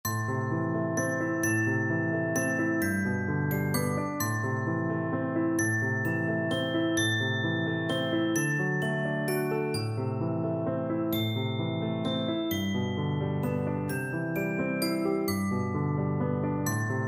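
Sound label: glockenspiel, percussion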